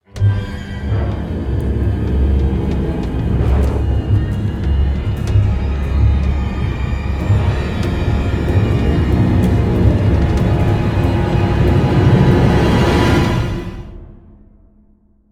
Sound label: music Transition To Combat